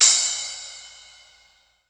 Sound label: SPLASH; E-MU Producer Series Vol. 8 – Platinum Phatt